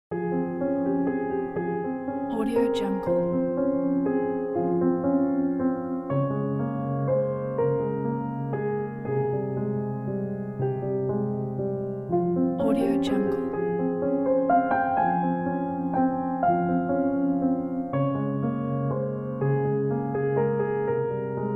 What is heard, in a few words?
sad piano; soundrose